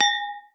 wineglass clang

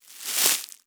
Wet Rustle

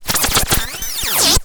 Miscellaneous Glitch

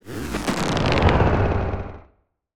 Sci-fi Transition

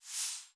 Soft Slide Short

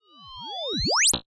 Evolving Sci-fi Beep

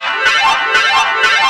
Slot Machine Win

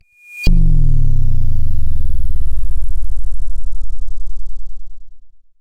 Approach Bass Drop

